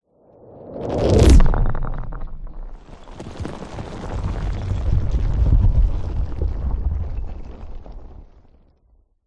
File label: sound design whoosh followed by rocks landslide